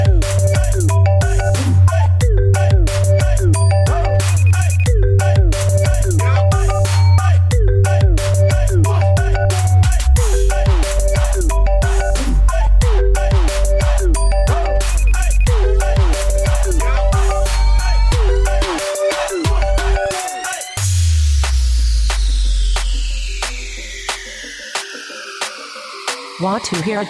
Bass; Iphone

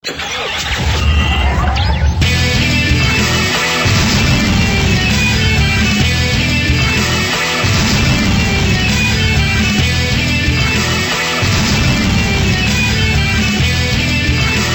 loop; Tridoron

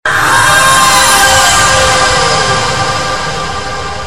sms

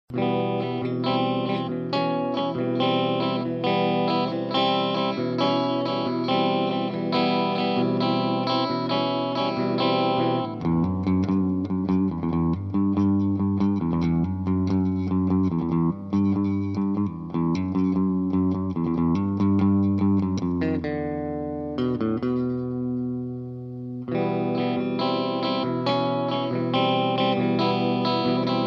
guitar

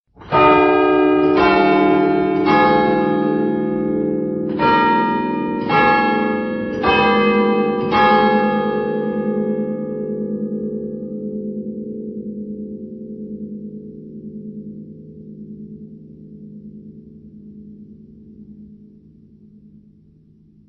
church bell 7 notes; christian

